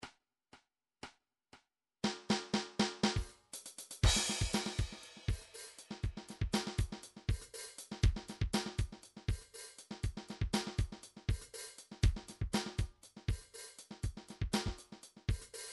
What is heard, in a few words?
rb 120 funky snare train; Sonar